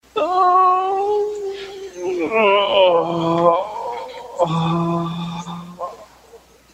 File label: groans